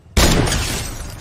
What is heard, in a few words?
sniper lourd headshot; fortnite